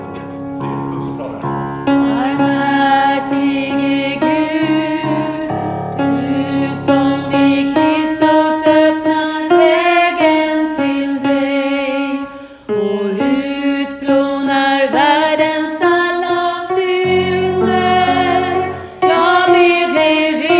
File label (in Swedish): Barmhärtige Gud Sopran